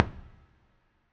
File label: piano body nosus